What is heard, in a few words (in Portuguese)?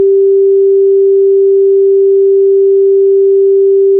nota SOL1 392.0Hz; Instrumentos Musicais